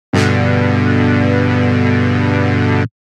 Analog Smooth n Brassy; jup8

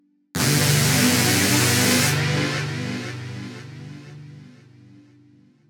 Jupiter Glide; jup8

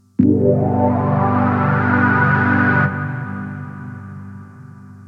Sweep Pad; j106

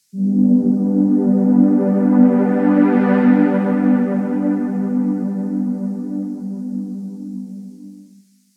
Smooth Pad; j106

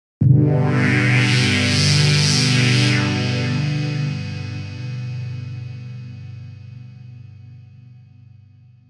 Trance, jup8; Cepheus

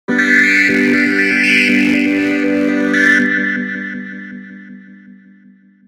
Techno Randomstepper, jup8

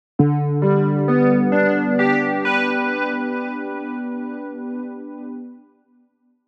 XMod Electric, jup8

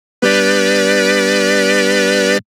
Analog Bomb Me Organ; jup8

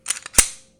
glock racking empty

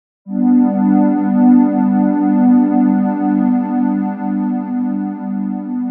Synthwave SOFT PAD, jup8

